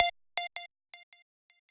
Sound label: lead